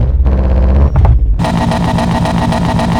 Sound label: v8 rev short loop; golf